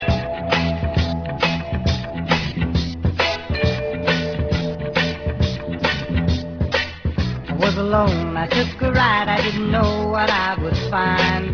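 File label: Brass Playing Mishaps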